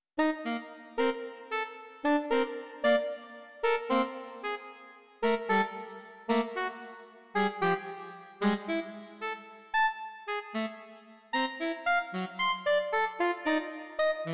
bagatelles for two saxophones animato

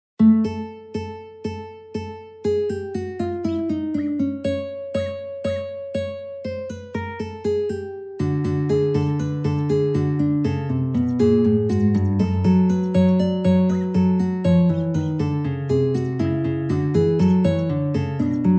invention major

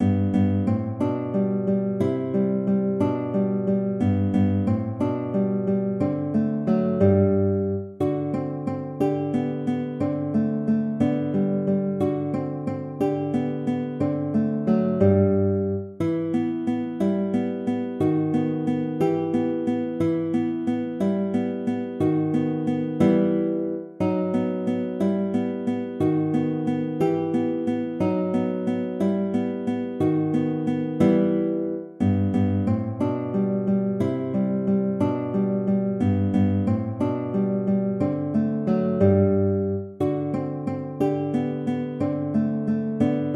waltz; Carulli Ferdinando

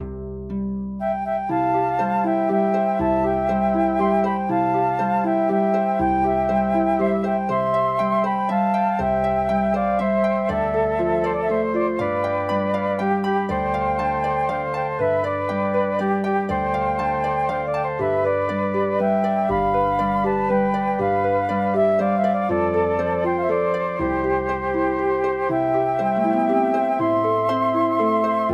old fenian song for flutes harp

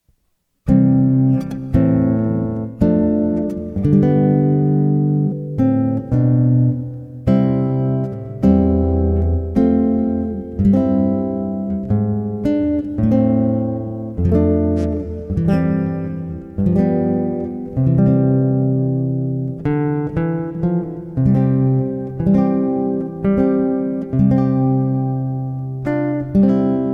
larghetto; carulli ferdinando

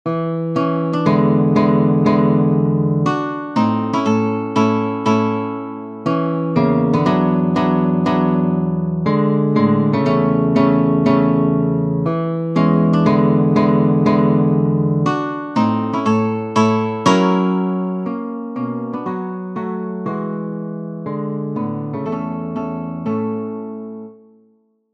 prelude major; chopin frederic

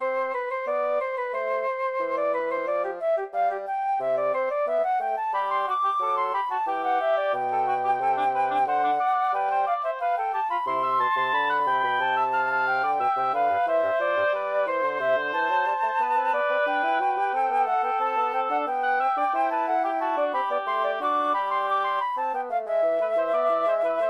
for woodwind trio; bach johann sebastian; sinfonia 12

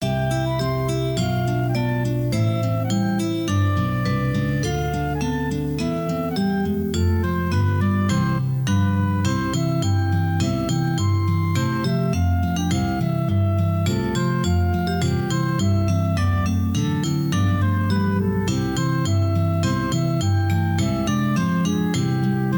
jingle bells

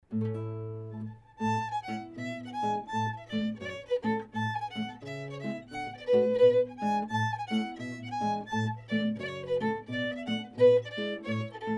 irish fiddle; redwood

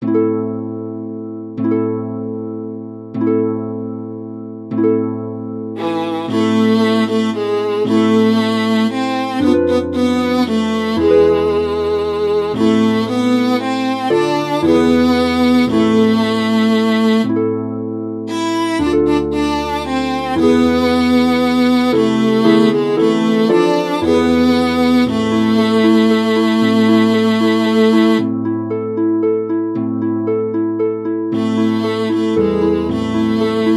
for viola harp

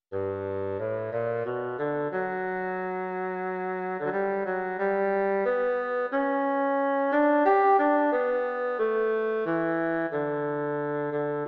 larghetto; intermezzi

